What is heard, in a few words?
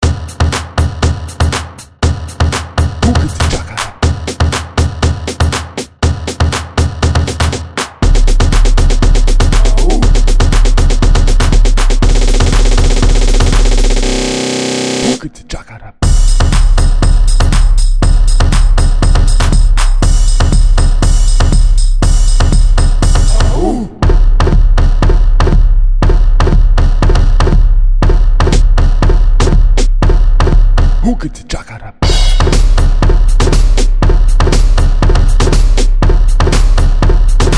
house tribal; drumtroop